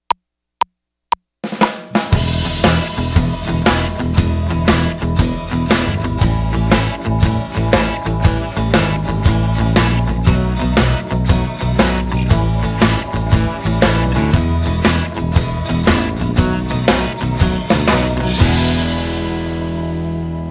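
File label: 8 Bars Of Blues Without Guitar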